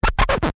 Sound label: Record Player Turntable; audio hero